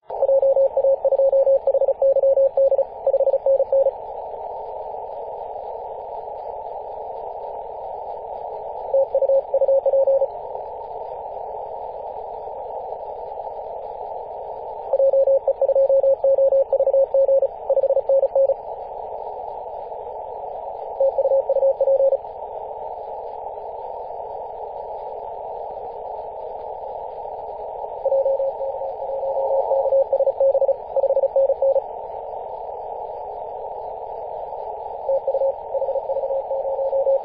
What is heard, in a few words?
18.071MHz CW